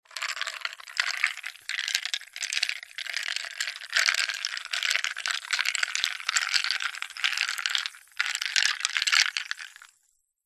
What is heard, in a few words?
soda cola in glass ice stir fizz; food drink; zapsplat